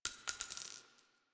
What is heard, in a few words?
AK47 Shell Casing; Warframe; Tenno; Weapons